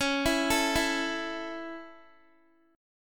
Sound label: Db Minor Sharp 5th Db Guitar; arpeggiated